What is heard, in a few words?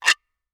guiro away ff stereo, Guiro, Hand Percussion